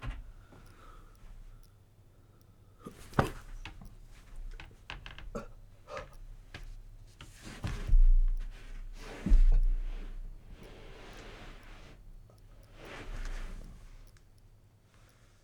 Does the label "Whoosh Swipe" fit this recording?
No